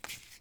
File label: shove; AfterStory; Doki Doki Literature Club